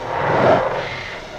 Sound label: Industrial